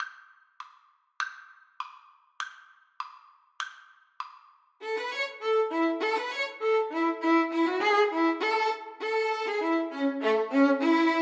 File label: violin duet